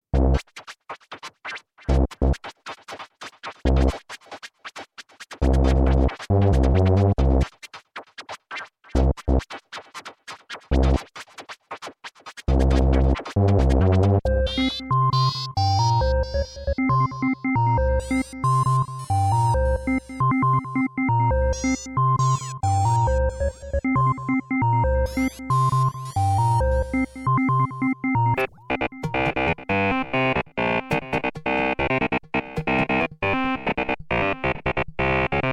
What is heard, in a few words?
Blip